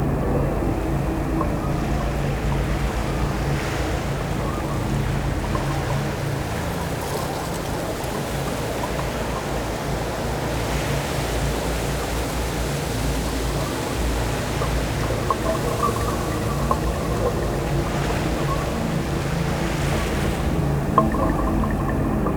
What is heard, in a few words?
Corrupted Beach Day Loop; Ambience